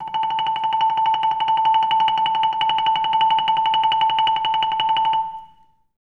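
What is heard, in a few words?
TREMOLO A3, Orchestra; Best Service; Peter Siedlaczek's Advanced Orchestra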